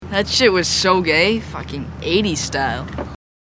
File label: freaking 80s style